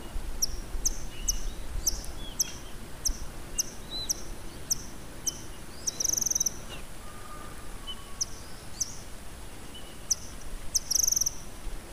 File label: White throated kingbird